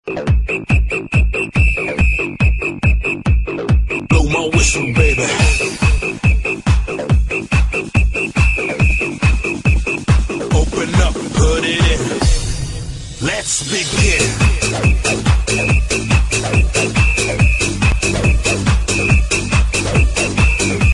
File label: ringtone